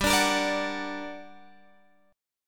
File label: G Minor Major 7th, down, Guitar, Drop D